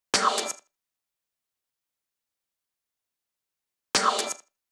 126BPM Cutted; Vengeance Samples; Inception Kit

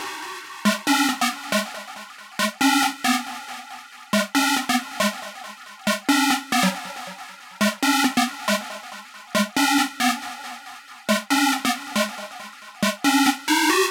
Mainlead 138BPM WET, G# minor; Melody & Synth; Vengeance Samples; Manhattan Kit

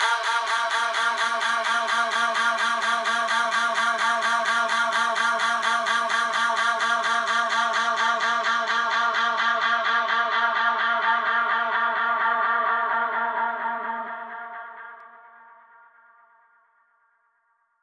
128BPM Ou Ou Ou A#, Melody Fills; 8Bars; Vengeance Samples

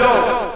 Vocal Oneshots; Vengeance Samples; Vocals

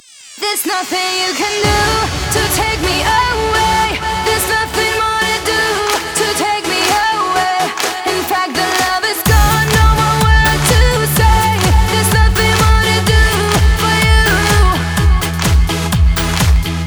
Female Mix, 126 BPM; Vengeance Samples; Vocal-Hook Kits; Song Kit

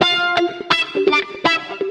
125 e, guitar licks; house; Vocal House; PS-28 Vocal House Samples; instrumentloops

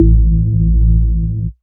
Bass Root A

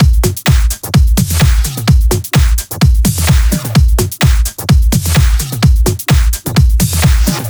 128BPM Kit; Vengeance Samples; Amplify Kit

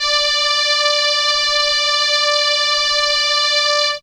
Trumpet D5, ARP Solina; musicradar-synth-samples

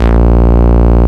Root A#, Ultimate Bass; Vengeance Samples

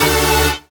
Synth Shot; Vengeance Samples

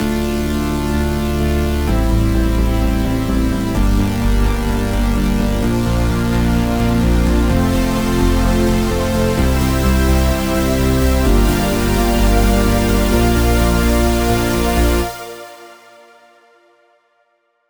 128BPM Dubwise Melody; VFH1 128BPM Dubwise Kit

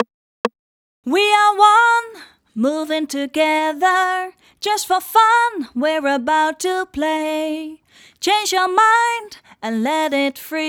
Verse + Bridge, 134 BPM; Vengeance Samples; One

